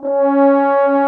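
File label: brass, FR HOR, F.Horns; Roland L-CD702; F.Horns FX+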